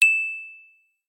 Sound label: Ting; LG Spectrum